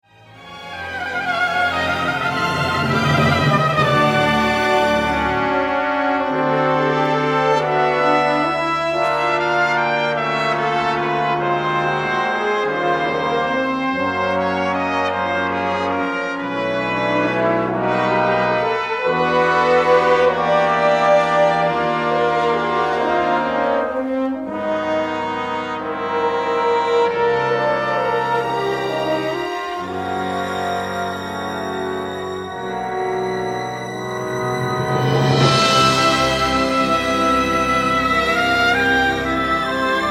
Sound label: Trumpet Concerto